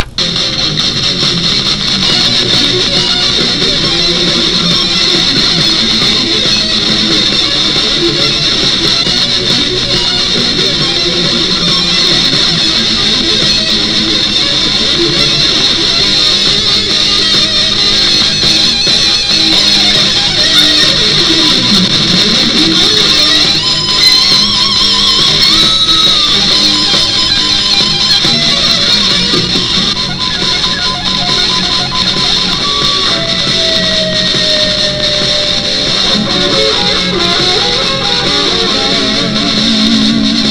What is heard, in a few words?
metal